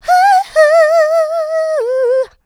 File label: vocal house adlibs; PS-28 Vocal House Samples